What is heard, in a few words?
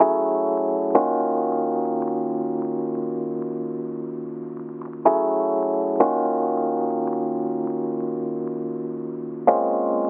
Lofi piano